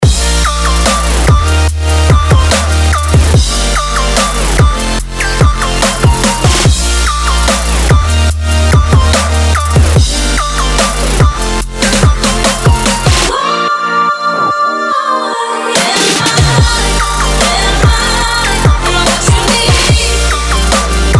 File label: melodic future bass